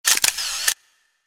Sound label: camera